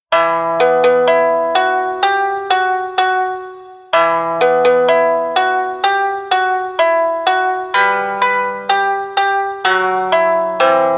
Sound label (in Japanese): 和風BGM